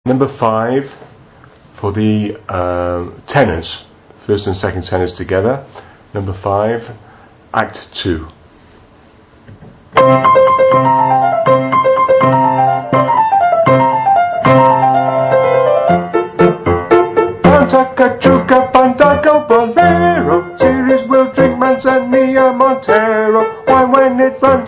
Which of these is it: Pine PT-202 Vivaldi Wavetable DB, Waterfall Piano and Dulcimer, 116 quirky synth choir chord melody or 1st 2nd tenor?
1st 2nd tenor